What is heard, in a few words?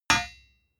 hammer hit steel